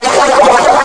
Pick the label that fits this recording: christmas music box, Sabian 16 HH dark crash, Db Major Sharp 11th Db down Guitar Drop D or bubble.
bubble